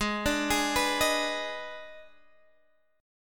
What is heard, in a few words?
D sharp Major 7th Suspended 4th Sharp 5th G sharp, chord